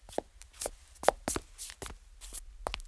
kroky; Mafie 1

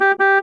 troubeni; Mafie 1

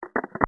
polozil; Mafie 1

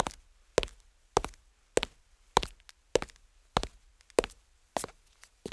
kroky; Mafie 1

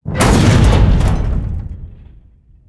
brana; Mafie 1

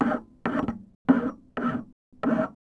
Pilovani; Mafie 1

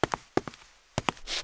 gang krok; Mafie 1

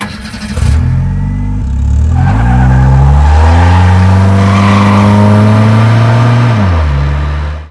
auto rozjezd; Mafie 1

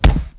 uder leva; Mafie 1

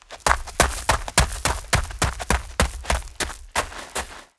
beh; Mafie 1

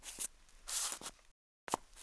soupani; Mafie 1

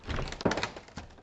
vrz; Mafie 1

